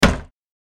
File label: cellar door quick close; hollywoodedge